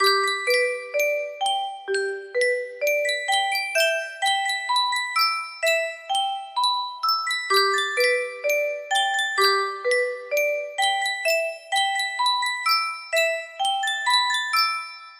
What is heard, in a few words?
yunsheng music box